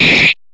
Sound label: Slash; Quest for the Sword of Justice